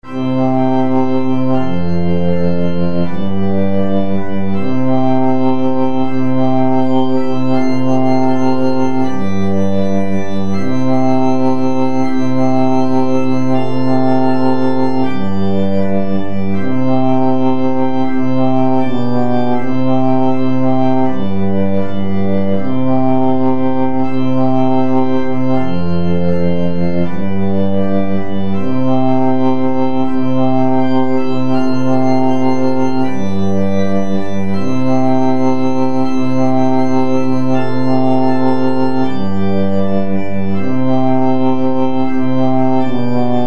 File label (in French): Kyrie Messe du peuple de Dieu, basse, midi; Messe du Peuple de Dieu - à quatre voix